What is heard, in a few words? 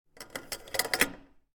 Key Cage Open; MiSide